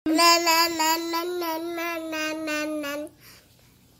text alert

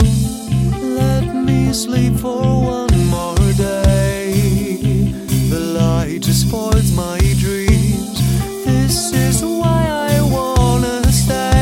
Growling Metal

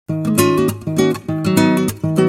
guitar sms

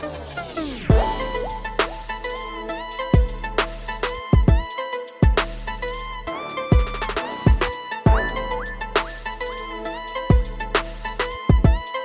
Amazing Hip Hop Loop

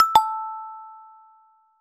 Nokia Message Tone

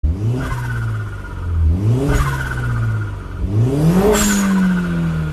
Mini cooper turbo

